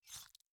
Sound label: gore dash